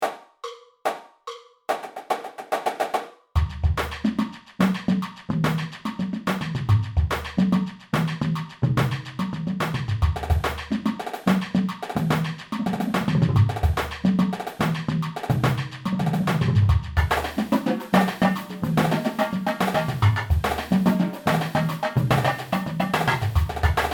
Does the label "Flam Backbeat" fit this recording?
Yes